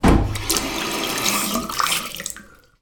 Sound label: household toilet basin tap on, water run quickly and switch off